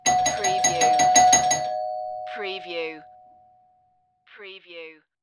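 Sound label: Door Bell Fast Repeat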